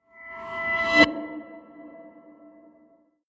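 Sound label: musical swell short reverse, drones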